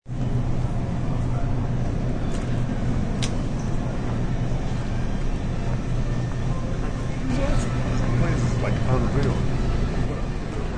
Grcy Wlk, Background sounds in a grocery store such as people, shuffling; carts, and registers; Stores And Mall; Grocery Store Walk